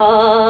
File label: CHEMBERLAIN, SYNTHESIZER; AKAI S6000 CD-ROM